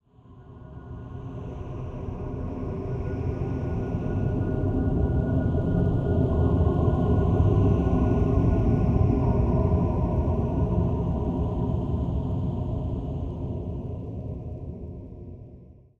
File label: Mansion Mood Amb; Pitch Black Audio Pong